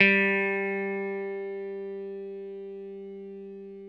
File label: 6STR BASS, Country; Sampleheads; Whole Lotta Country 1 & 2